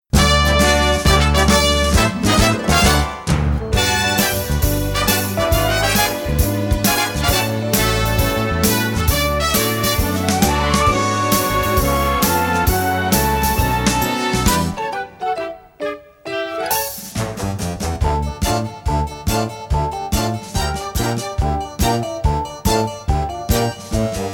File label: end song instrumental